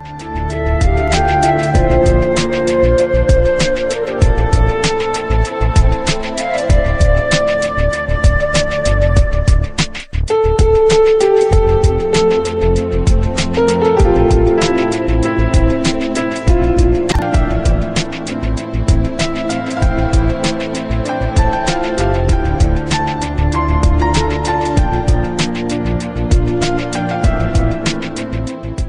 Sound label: Love Flute